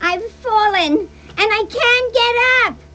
VOC

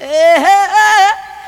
VOC; ZERO-G; Datafile

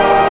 CHORD

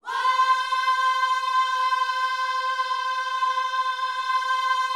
WHOA C 5, Vocal Planet Gospel; Spectrasonics; Vocal Planet Vol.1 Gospel; akai

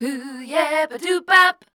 MELODIC C, Voice

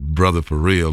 VOX-BRO; E-Lab; X Static Goldmine 2; akai

